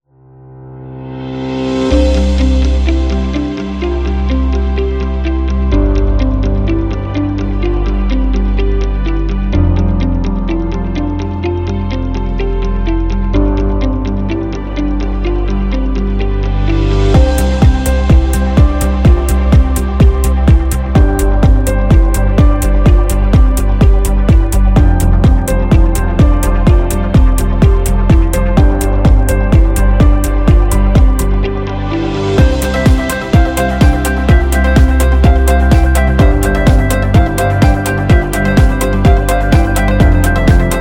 Institution Corporate

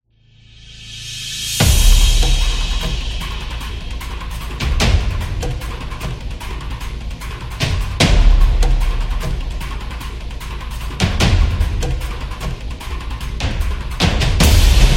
Africa Cinematic

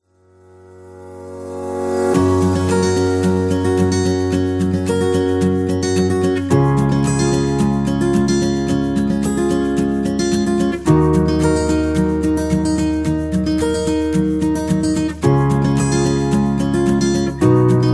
Positive Acoustic

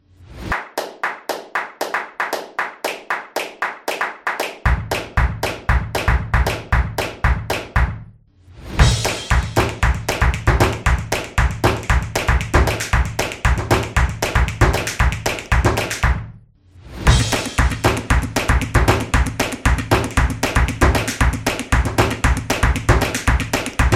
The Clap Energy, drums energy

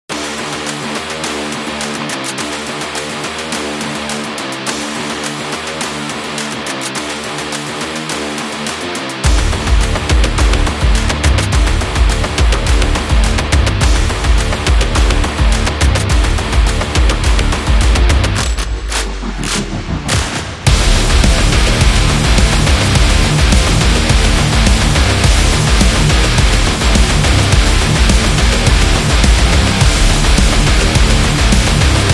Action Sport Rock, electro rock

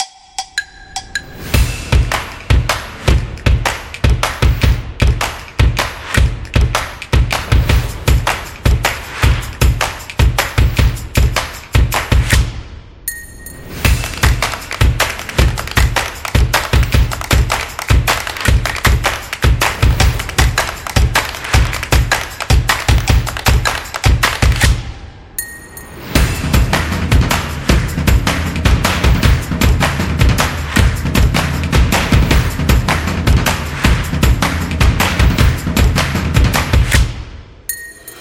Energetic Claps Percussion; drums trailer

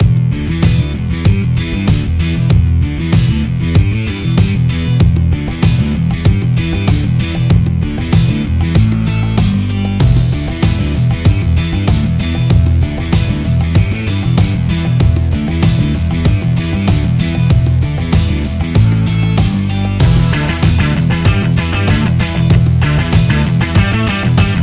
Funk; jazz club

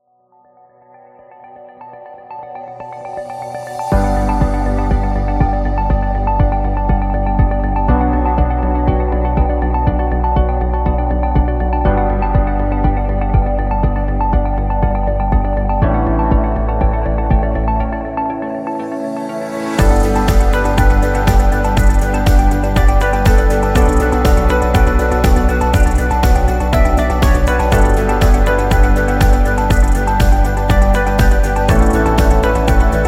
Innovation Technology Corporate Music

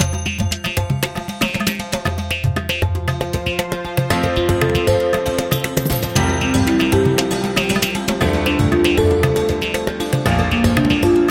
In India; sounds of the world

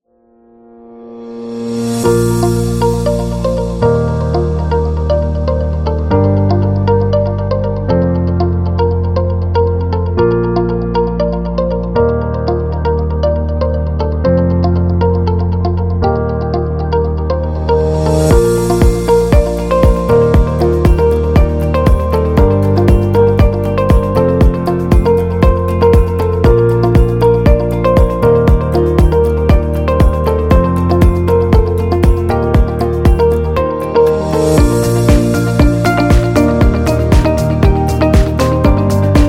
Be Corporate Upbeat

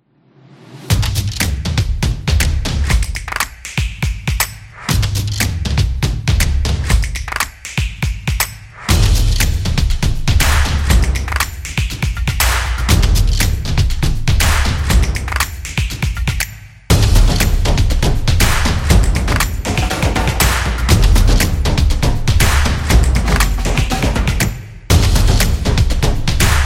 Drumming Beat Motion Graphics; high energy

